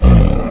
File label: idle, shambler; Quake